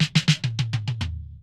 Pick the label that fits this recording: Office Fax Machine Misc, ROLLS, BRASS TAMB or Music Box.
ROLLS